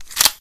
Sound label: handgun mag in; rangedweapons